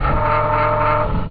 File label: Servo; blastmorph pinball; xbox series xs